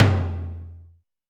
Tom Lo Ring; Alesis D4

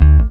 E bass (D) short note; Sonic Foundry (Sony Creative Software); Ugly Remnants VOL-2; Basstuff

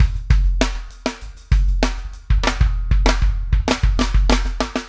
SHRED, Backbeat

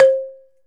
Balafon C4

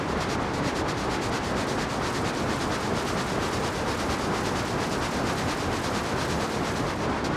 Moving Noise 130, Tempo Loops 130bpm

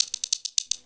Snappy Riff, Percussion; Sonic Foundry (Sony Creative Software); Futurist Drum & Bass

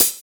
Hhat, Hihat; Equipped Music; Loungin House

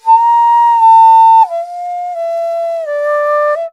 INDIA ALTO

